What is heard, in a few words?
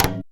JUNJUNBA HIT; Best Service; World Colours